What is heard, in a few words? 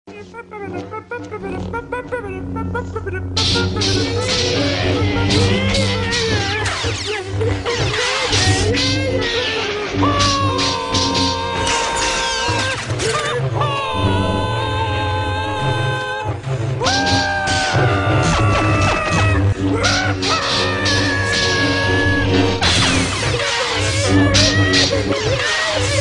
Lightsaber Fight